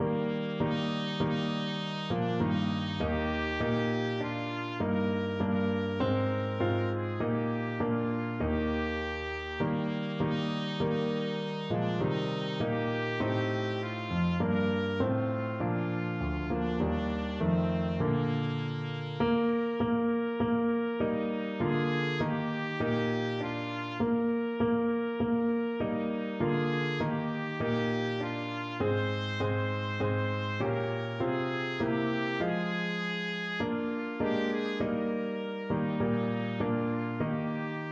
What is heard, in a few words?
hark, trumpet